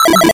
retro synth beeps fast

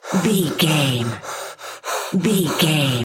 voice male breathing mask loop run

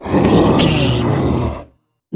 troll monster effort growl small

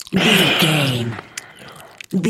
zombie voice eating chewing

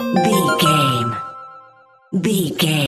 jingle chime positive

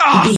voice male hurt pain

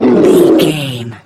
troll monster hurt pain short

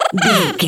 goblin fairy expression emote

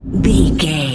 whoosh slow deep

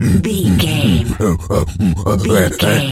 gorilla chatter

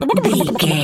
turkey ostrich gobble

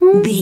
voice fun small character emote interested

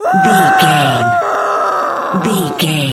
voice female scream low

